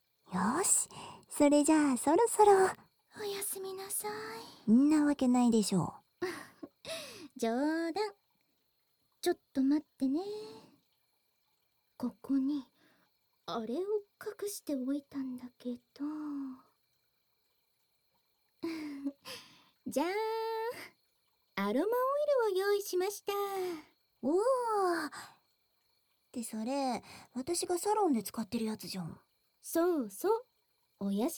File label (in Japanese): 極上オイルマッサージ, ASMR